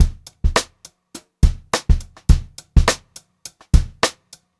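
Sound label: 104 straight beat, Funk Groove Drumloops; USB Soundscan vol.38; AKAI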